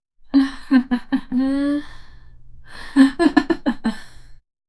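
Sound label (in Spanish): mujer sonriendo pícaramente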